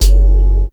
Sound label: HH FX, 92, Hip Hop R n B; USB Soundscan vol.19; AKAI; TIMBA